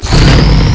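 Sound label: Chain Pickup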